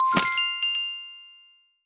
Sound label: gold coin prize